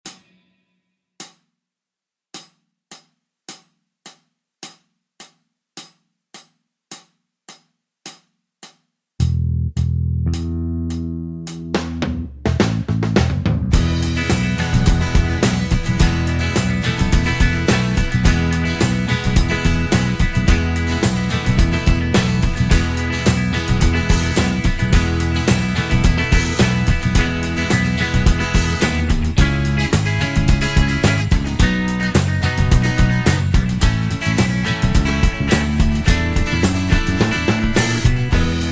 No Lead Guitar

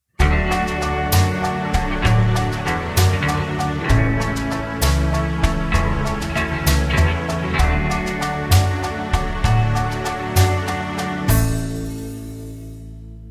(D) No Backing Vocals

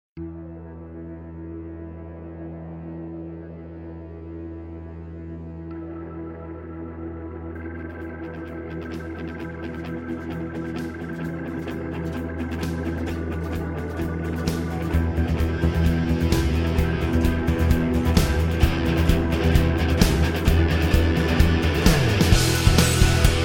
Em No Backing Vocals, backing tracks

